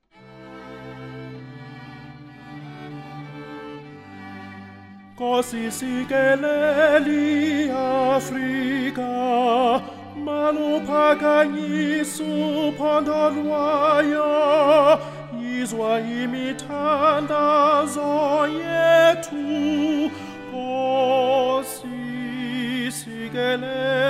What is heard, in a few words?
tenor voice singing the tenor part